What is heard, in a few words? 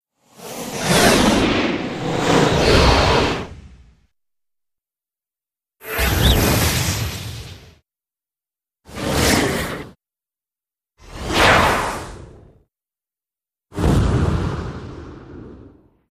Explosive Whooshes